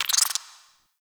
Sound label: Insect; Liset Alchemy Lab